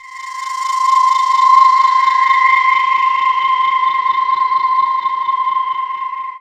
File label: C, Drum & Bass Pads